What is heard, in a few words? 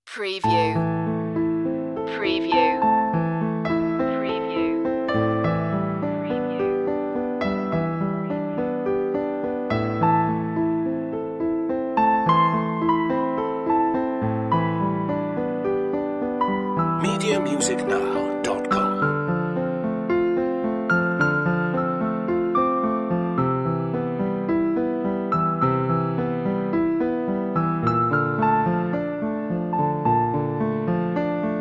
piano